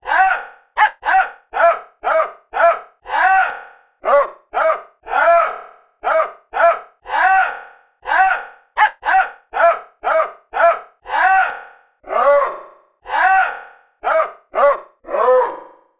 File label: dog song, 狗 唱 倫敦鐵橋; Csound pvoc